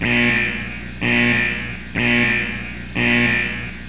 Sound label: alarm